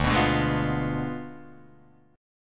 D Diminished 7th D down Guitar Drop D

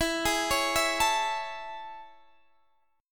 A Major 7th E

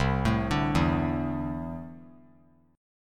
C 6th Add 9th C down; arpeggiated; JGuitar

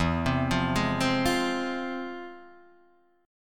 F Minor E; Fm/E arpeggiated; JGuitar

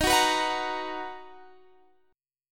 B Major 7th Eb

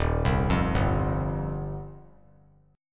D sharp 6th Add 9th, D sharp, down; arpeggiated; JGuitar

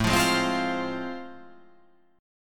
A Minor Major 7th Sharp 5th

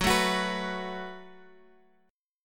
Gb Minor Double Flat 5th, Guitar Open F; Gbmbb5 strummed; 18,16,18,18,x,x

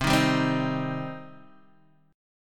C Minor C down Guitar Open D

